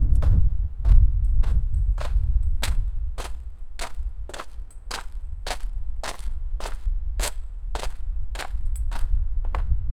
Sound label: group of prisoners walkin, people